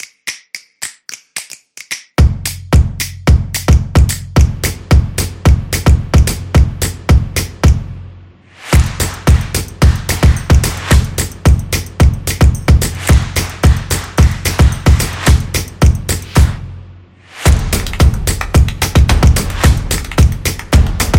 Clap, energetic percussion

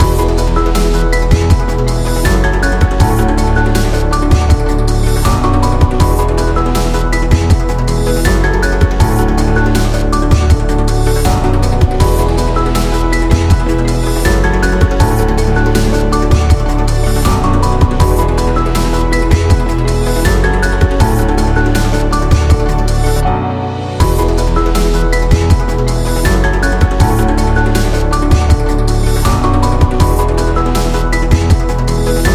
Digital Loop

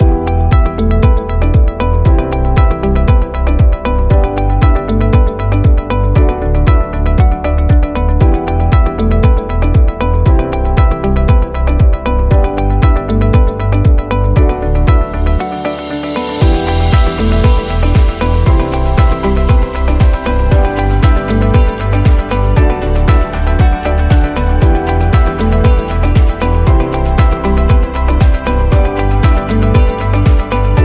Pop Corporate; technology

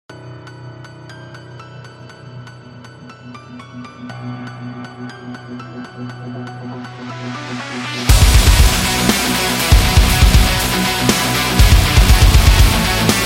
Powerful Metal

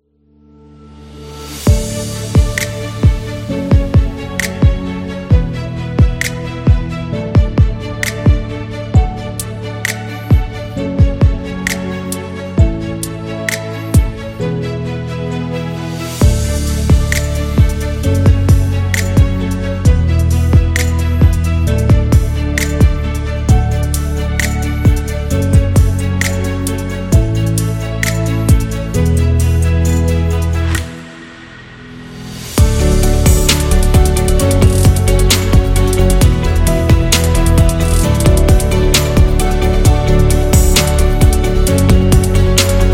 Real Estate Chill

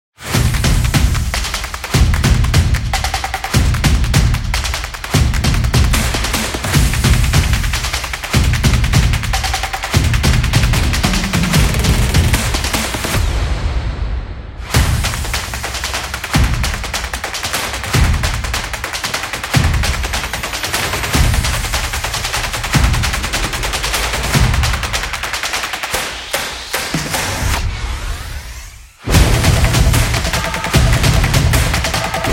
Dynamic Intro

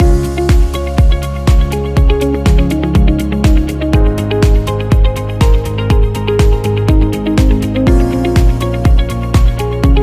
Upbeat Loop

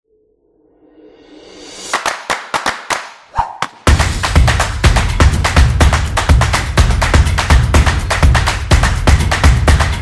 Drums Action

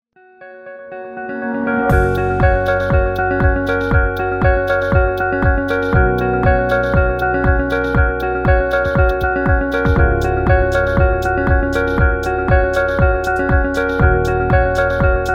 Technology Minimal Background, corporate music; corporate music vol 6

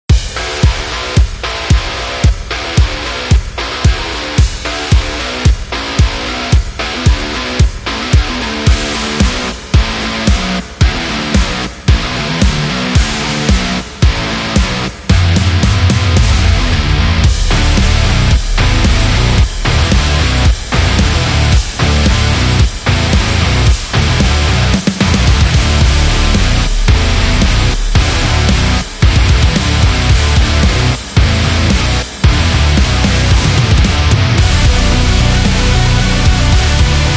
Rock Ad

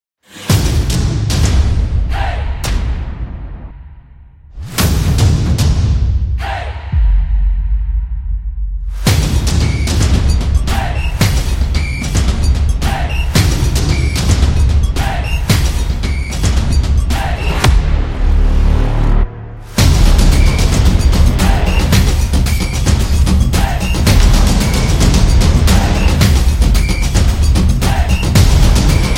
Sport Drum

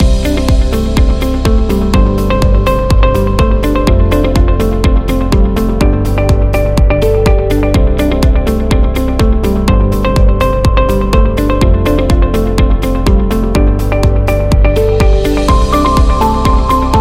School Loop

